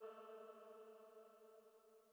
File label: Female Pad A2